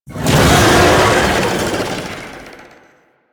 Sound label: Lasrian Tank Infested Vocal Attack Swipe; Enemies; Barks; Warframe